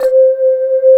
SYN, 140 BPM; Ueberschall; Drum & Bass Flash Trackz

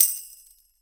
Tambourine; Percussion

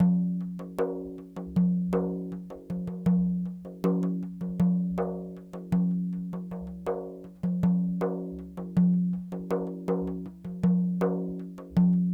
Sm Frame, World Percussion

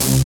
SYN, dance; Sound & Vision - Gigapack II

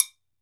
TOKE BELL, African Perc; E-MU Producer Series Vol. 7 – Old World Instruments

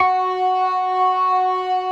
CLASSIC C3, Synth and Organ

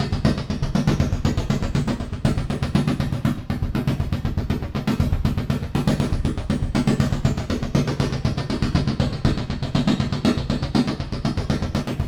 synth beats; Zero G; Malice In Wonderland

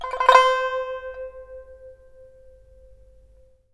SAN XUAN, C6; Spectrasonics; Heart of Asia

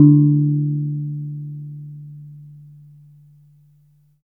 GONG LO D3, GAMELAN; Spectrasonics; Heart of Asia

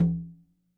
Conga Hit, Latin Percussion, One Shots; Sonic Foundry (Sony Creative Software); Joe Vitale Latin Percussion

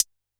F#2 Analog HH Closed 1, Yamaha PSR-E323

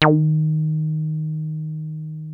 303 C3, TB 303; Hollywood Edge; Giorgio Moroder Rare Synthesizer Collection

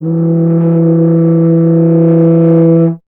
BRS TUBA, Solo Instruments

